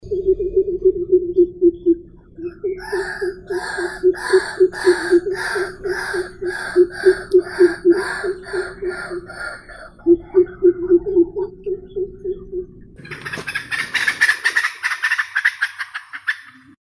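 white-breasted waterhen Amaurornis phoenicurus, nature sounds; pogonish belogrudii; zvuki prirodi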